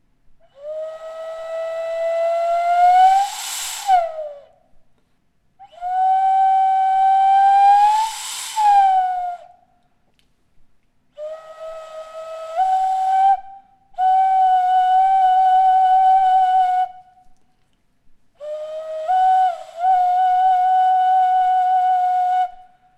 silbato en forma de mono

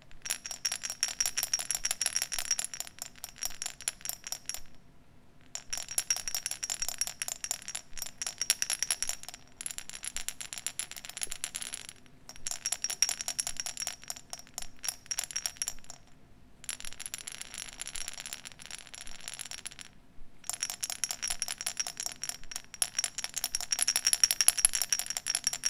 maraca con forma femenina

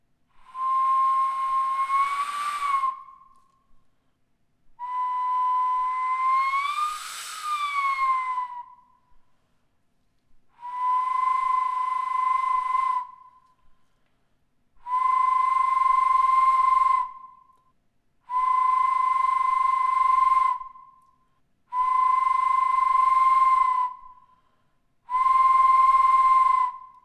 silbato doble en efigie hombre felino

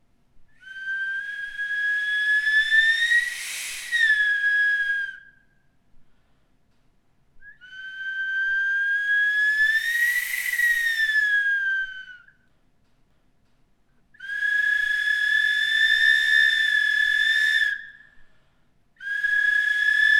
silbato doble en efigie hombre felino

